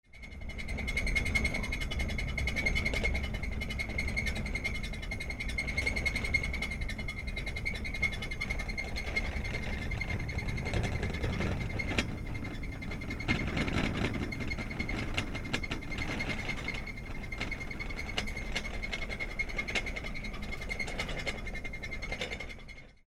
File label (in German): teller scheppern